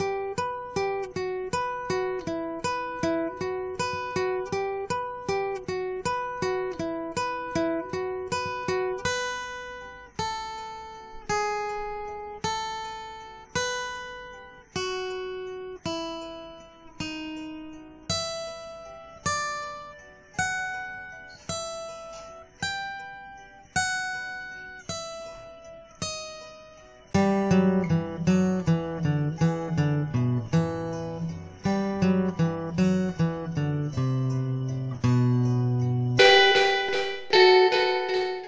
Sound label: guitar waltz, Sad; Loops